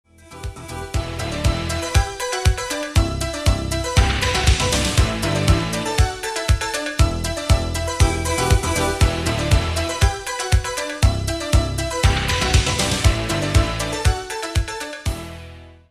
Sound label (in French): version instrumentale